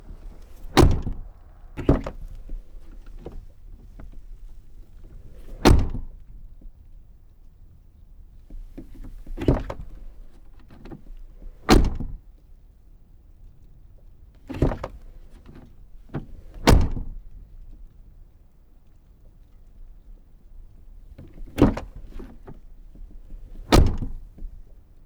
Jeep Grand Cherokee WJ 4.7 foley exterior door open close mono; V8 Magnaflow; SoundHolder